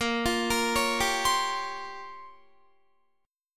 A sharp Minor 6th Add 9th A sharp down Guitar, chord; A#m6add9; arpeggiated